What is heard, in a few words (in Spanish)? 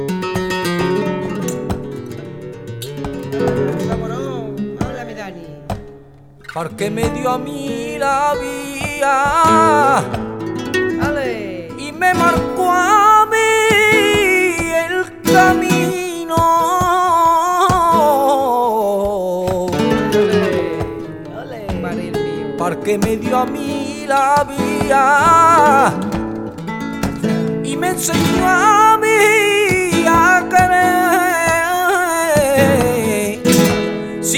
letra fandango libre